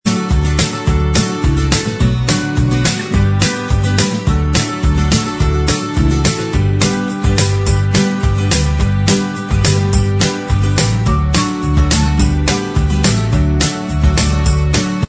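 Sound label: Inst Track, instrumental track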